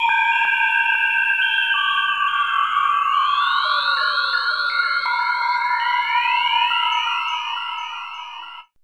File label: SPACE ATMOS; AMG - Vince Clarke Lucky Bastard